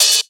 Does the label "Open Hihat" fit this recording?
Yes